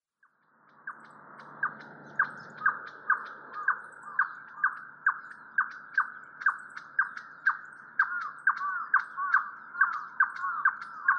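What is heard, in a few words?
Ruddy-breasted Crake; hikuina; watarase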